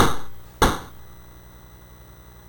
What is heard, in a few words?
BASIC sid MOS6581R4, GAMES; Sword of Fargoal